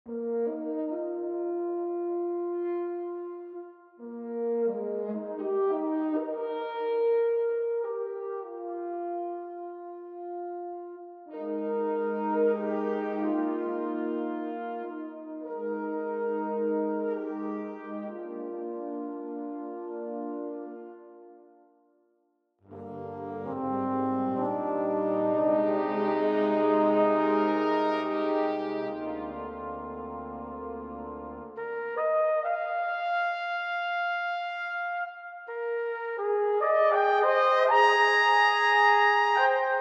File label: Brass